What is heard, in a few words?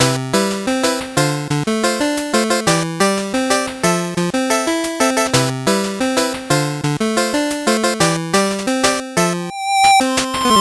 8bit bgm slow